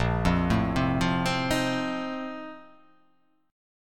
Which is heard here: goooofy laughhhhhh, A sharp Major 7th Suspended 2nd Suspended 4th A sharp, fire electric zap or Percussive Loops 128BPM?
A sharp Major 7th Suspended 2nd Suspended 4th A sharp